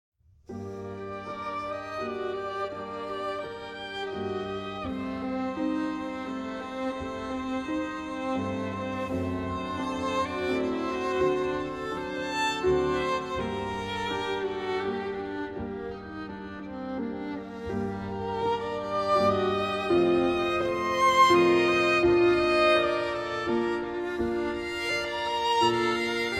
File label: Siciliano, Flute Sonata in E-Flat Major; Second Movement; BWV 1031